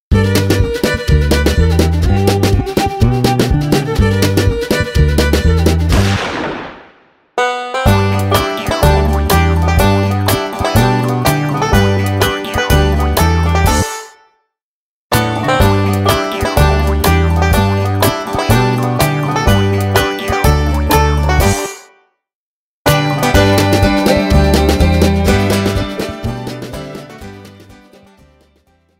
cowboymusik instrumental

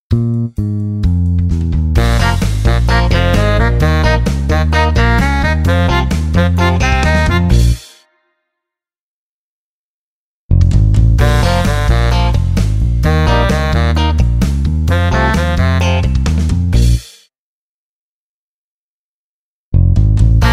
lange pausen im 4 4 instrumental

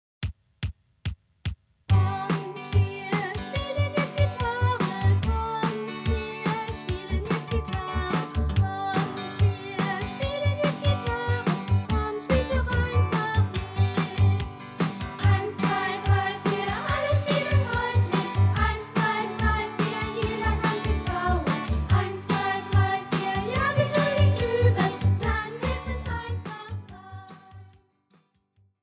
kommt wir spielen jetzt gitarre

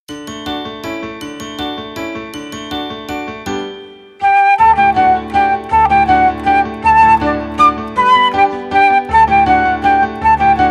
drei uhren instrumental

